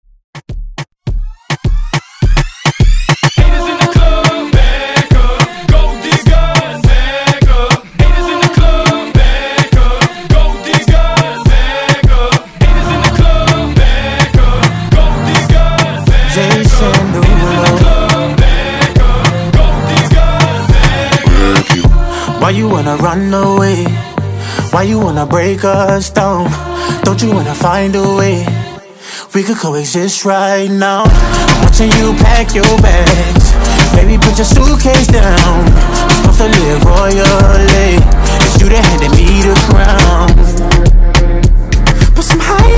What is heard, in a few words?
Reggaeton Redrum Hype